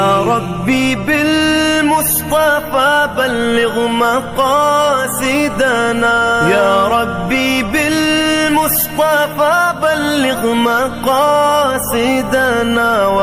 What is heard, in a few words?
Beautiful Naat Ringtone